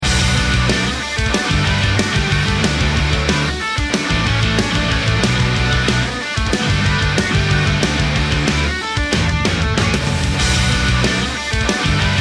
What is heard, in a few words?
bomb planted; music